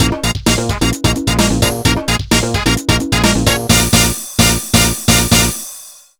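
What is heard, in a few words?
BGM Dance battle; Irodzuki Tingle no Koi no Balloon Trip 2009 NDS gamerip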